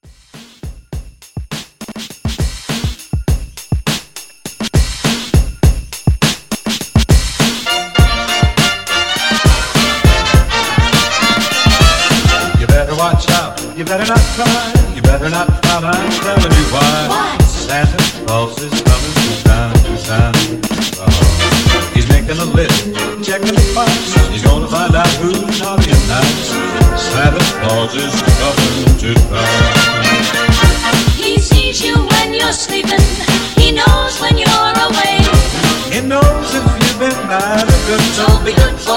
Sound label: Christmas Holiday Re Drum